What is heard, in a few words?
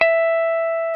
Jazz Guitar; 90's Sample CDs; Club-50 - Foundations Roland; Jaz-Emperor